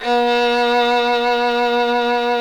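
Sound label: solo violin, Synclavier Strings; ILIO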